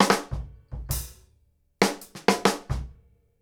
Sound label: FILL